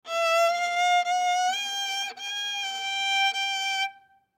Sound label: Viola Run Scratch, Viola Run Scratch Squeak Child Practice; Strings; Blastwave